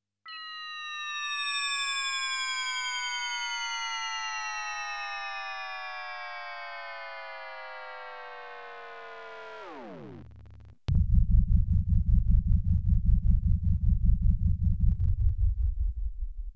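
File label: electronic sound whistle of a bomb and explosion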